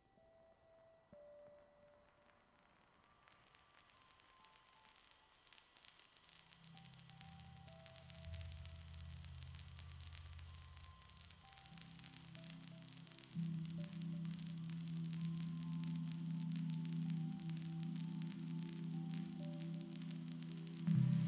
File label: Ambient noise Modular; ambient and noise session for Modular Mondays